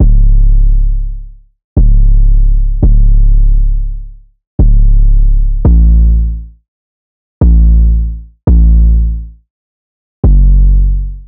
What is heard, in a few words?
170 BPM C# Min 808; Melody Loops; Loop Stems; Cymatics; Anthem